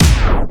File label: dj disco effect 1beat